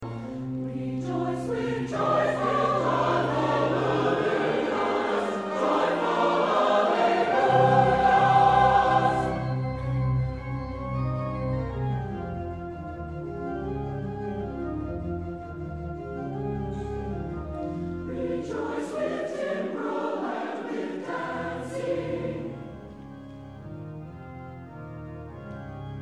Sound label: Fanfare